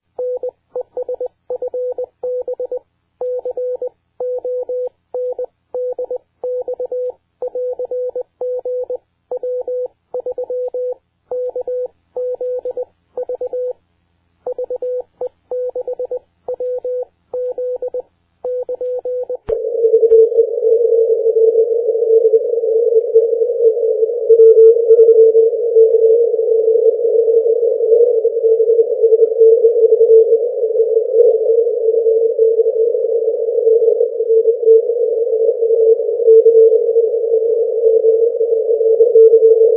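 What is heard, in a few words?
80m short path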